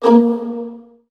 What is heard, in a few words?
Virtuoso Strings PZZ A#1 L; ILIO; Kirk Hunter Virtuoso Strings VOL-2